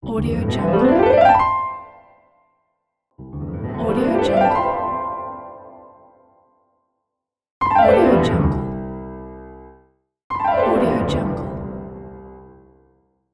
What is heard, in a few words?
piano slide up down; albasfx